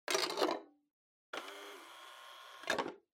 Portable Stereo CD Put In Close

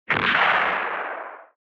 Retro Anime Gun Low Short

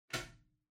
Wall Outlet Far Wall Unplug Hard

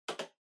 PC Keyboard Far Enter Mid

